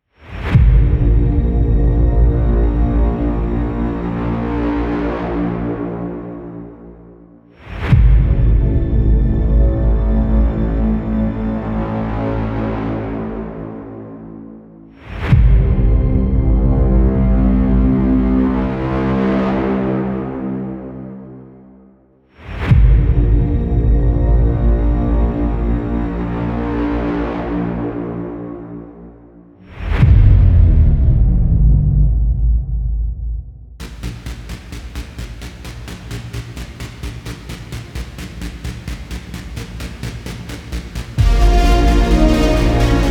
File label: Dark Space